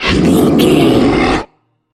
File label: troll monster effort struggle